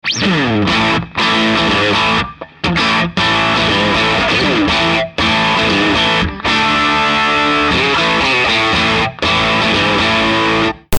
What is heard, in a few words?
Swamp Rock